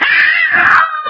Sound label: pain, poisoncrab; svencoop; hl2